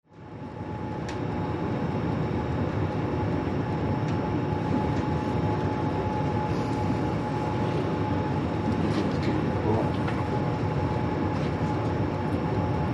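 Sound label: Cruise Ship Interior Bridge; ships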